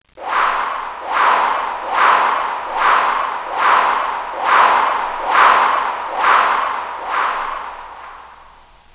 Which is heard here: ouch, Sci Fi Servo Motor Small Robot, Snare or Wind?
Wind